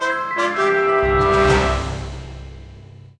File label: Jackpot reward